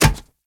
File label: Kubrow Step Metal; Warframe